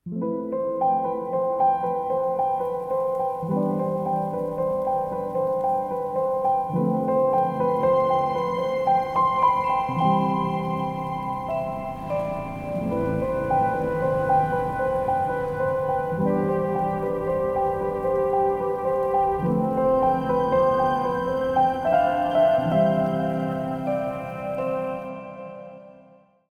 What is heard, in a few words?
Gentle, phonetones ringtones; KDE; Plasma-Mobile-0.1